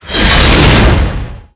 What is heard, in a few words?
Spear Storm; Kundun